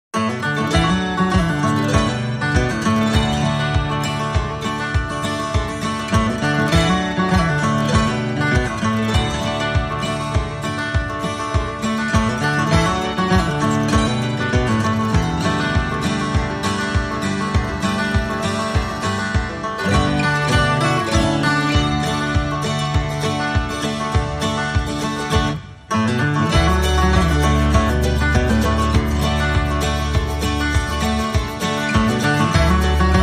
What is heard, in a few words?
No Frog No Glockenspiel No Piano